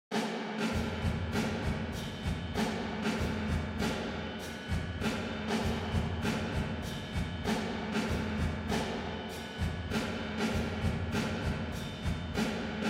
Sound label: Kit Plate Vintage; Eventide; SP2016